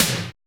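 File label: energy tom lo; Drums In Your FACE!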